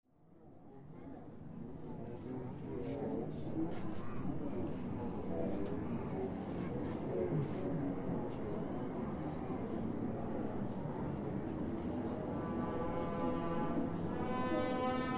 Celebrations in old town square crowd fanfare fireworks firecrackers then band; Parade Sound Effects